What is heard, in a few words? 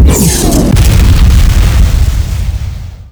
Explosion Sound